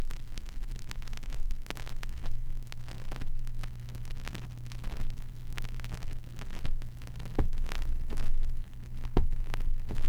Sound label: static soft creaks, vinyl